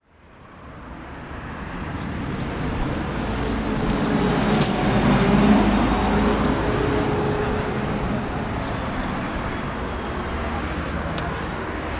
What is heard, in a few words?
Mech Passing